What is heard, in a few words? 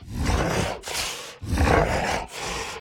Walk Cycle Burns; The Town